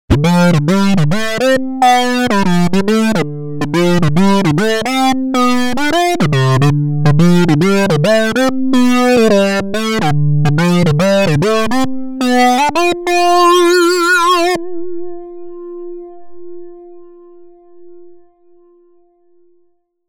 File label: sync solo; opxpro